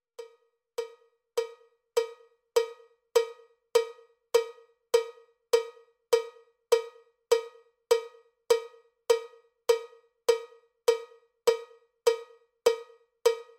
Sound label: cowbell click track bpm 79; cowbell click track bpm 101